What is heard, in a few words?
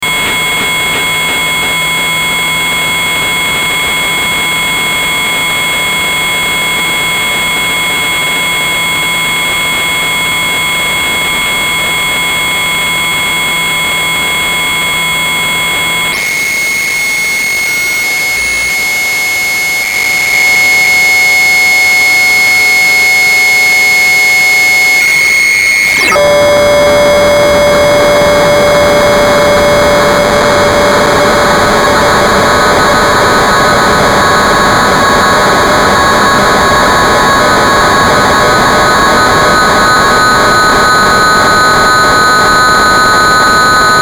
drone, noise